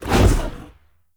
Wolf Claw Idol Dash Whoosh; Powers; Orengall

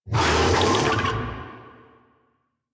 Infested Big Ashen Vocal Attack; Warframe

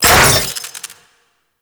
Weapon Disarm; Warframe; Loki

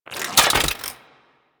Spear Equip, Weapons; Warframe; Corpus; Spear Gun

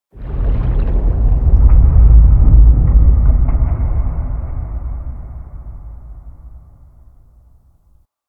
Cave Underwater Rattle; Ambience; Warframe; Stalker Cave

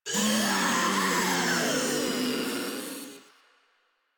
Infested Ancient Death; Warframe; Proto Infested

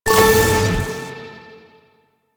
Hack Minigame Click After Hold C

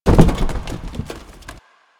Cardboard Boxes Destroy; Warframe; Ambience; Nineteen Ninety Nine

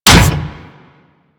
Step Metal; Warframe; machines; Jackal

